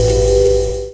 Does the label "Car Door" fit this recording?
No